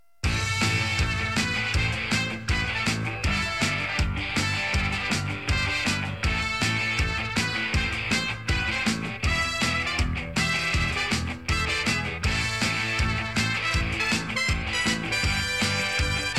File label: minor circuit